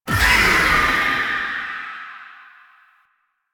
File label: Apparition Spectral Cast; Warframe; Warframes; Dagath